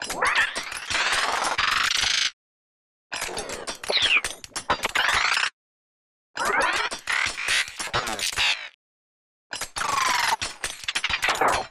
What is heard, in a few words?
Mechanical Metal Morph Agressive Sharp; Bottle Rocket Fx; Cyborg Collection